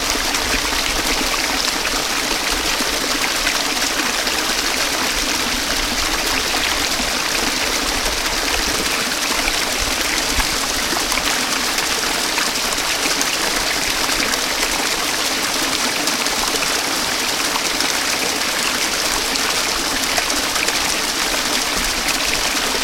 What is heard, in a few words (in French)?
eau